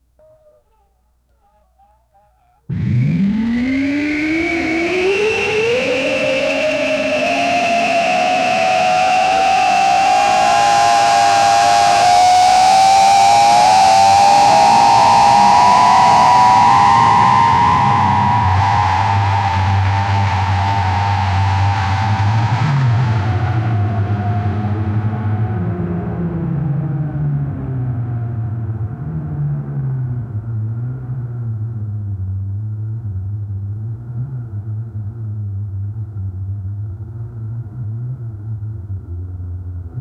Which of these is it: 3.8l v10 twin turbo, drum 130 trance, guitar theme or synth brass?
3.8l v10 twin turbo